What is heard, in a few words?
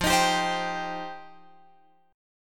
F 7th Suspended 2nd F down Guitar Open F; F7sus2; strummed